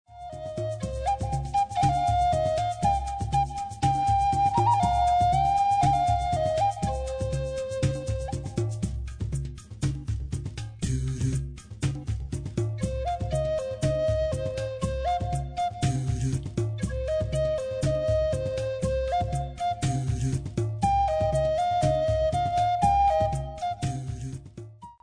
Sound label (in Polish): muzyka bez oplat